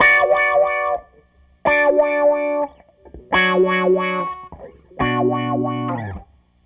SG Guitar Loop

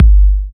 Bassdrum Clean

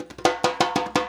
FILL, Percussion

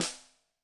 Snare, Yamaha DTXpress-II

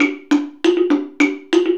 Slit Drum; Sonic Foundry (Sony Creative Software); Essential Percussion; Drums

